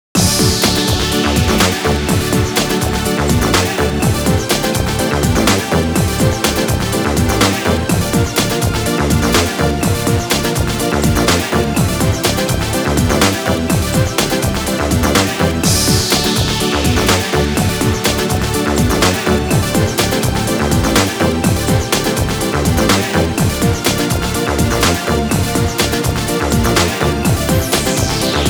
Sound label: Result Win